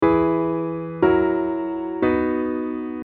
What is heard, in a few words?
B dim